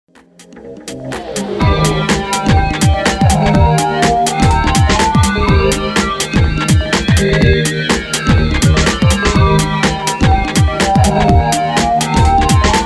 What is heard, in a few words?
Instrumental